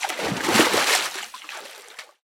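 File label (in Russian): лазурная волна бьющаяся о скалы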